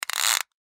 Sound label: Clicks Dial Device Object Short; Foley; Blastwave